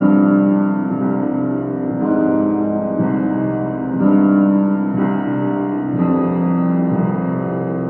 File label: piano, Loops Sad